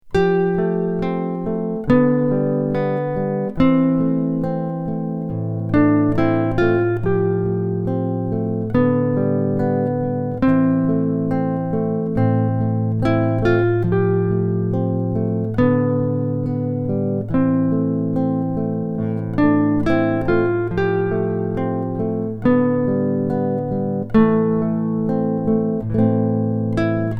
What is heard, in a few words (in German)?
duet, Ballad Style Git-Duo; Leicht